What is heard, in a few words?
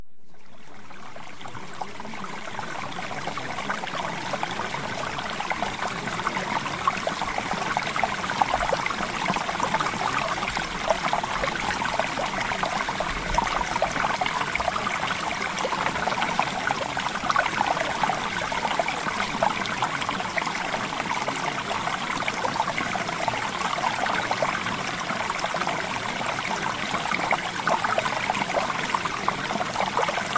Water